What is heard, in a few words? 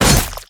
Melee Physical Heavy; Kilta